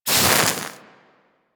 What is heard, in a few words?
Axe Projectile Impact E; Sundial